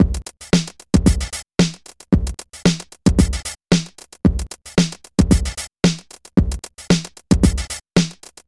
Woody Drums

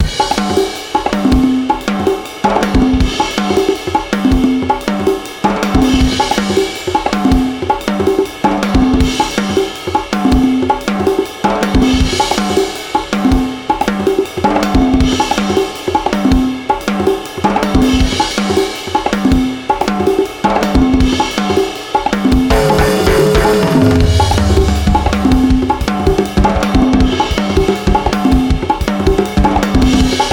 Vicious Jazz Groove

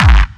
Bass Mega Meat

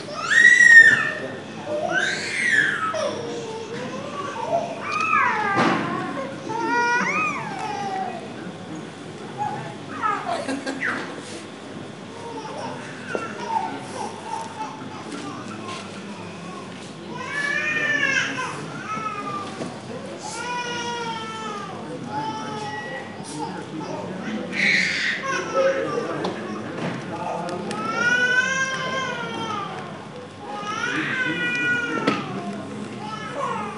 Child Crying In Airport Lobby

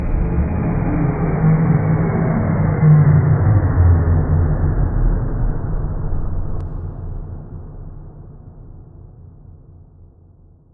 Horror Ambient Drop

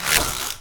Window Shades

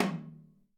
Bongo low

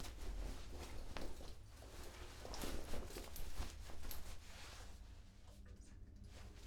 Wearing A Jacket

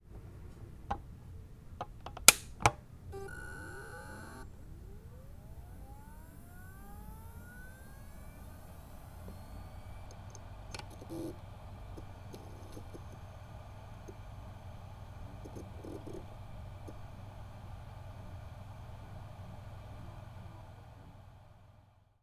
Hard Disk Drive Turns On And Spins Up To Speed

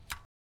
Rotating Switch

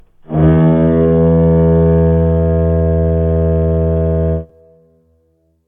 Ship On Fog

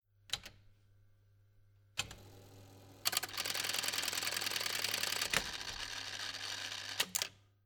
Juke Box Search

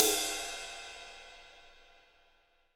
Right Ride

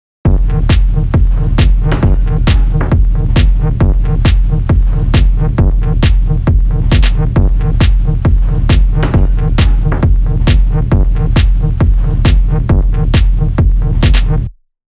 Techno Beat Heavy Loop 135bpm

